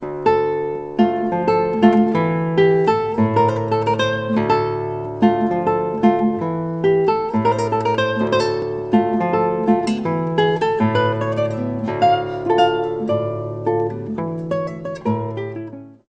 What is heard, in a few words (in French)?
Guitare torres